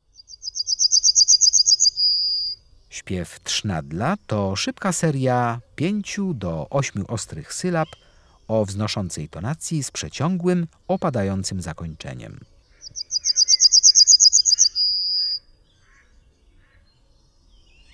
Trznadel, Ptaki; Kurs PTTK

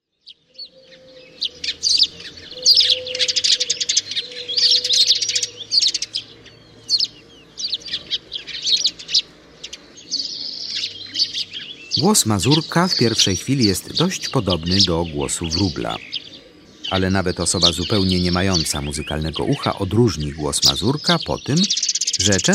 Mazurek, Ptaki; Kurs PTTK

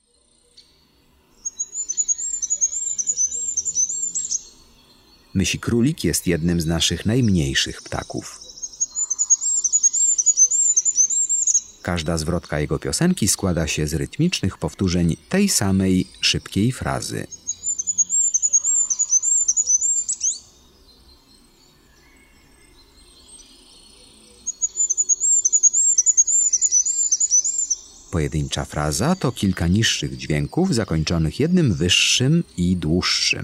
MYSIKRÓLIK, Ptaki; Kurs PTTK

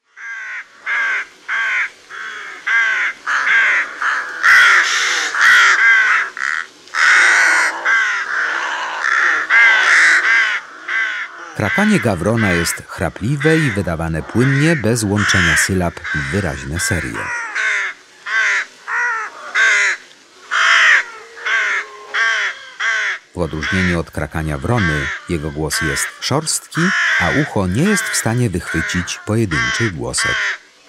Gawron, Ptaki; Kurs PTTK

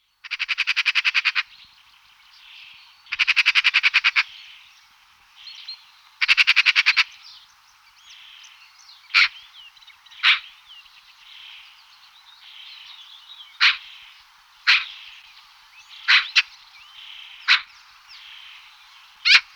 Sroka, Ptaki; Kurs PTTK

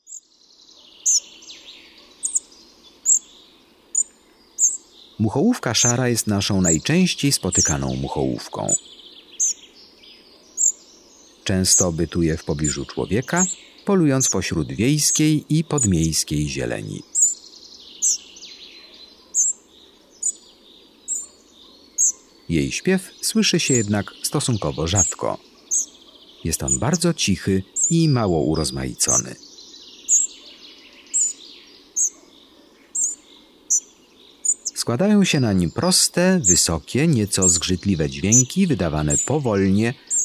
MUCHOŁÓWKA SZARA, Ptaki; Kurs PTTK

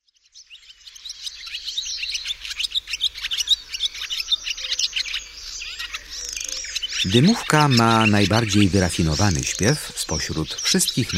Dymówka, Ptaki; Kurs PTTK

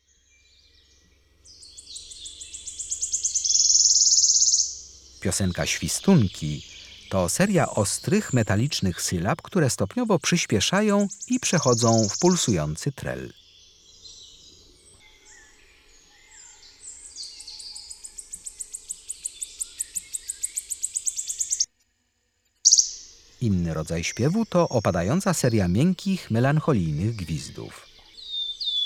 Swistunka, Ptaki; Kurs PTTK

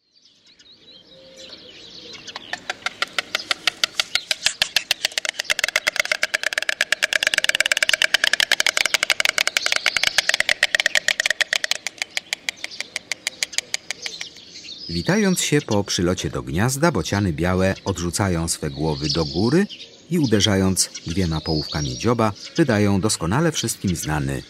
Bocian, Ptaki; Kurs PTTK

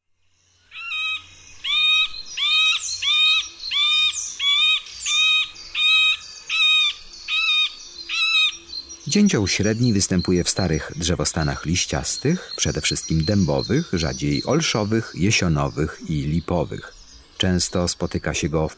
DZIĘCIOŁ ŚREDNI, Ptaki; Kurs PTTK

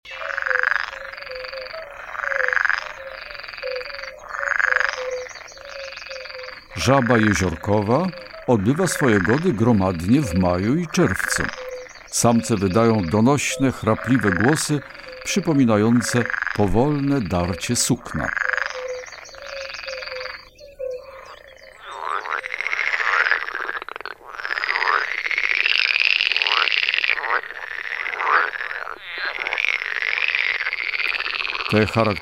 Zaba jeziorkowa; Kurs PTTK; Przyroda; Tajemnice puszczy

